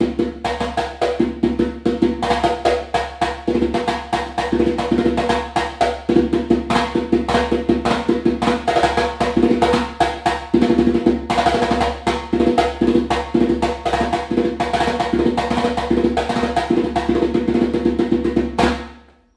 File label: Timba